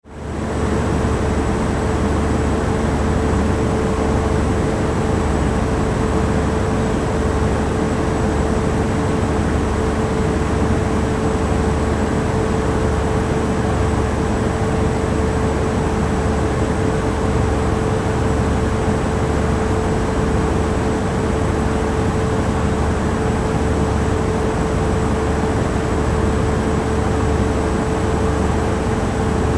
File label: Ship Large Car Ferry Machine Control Room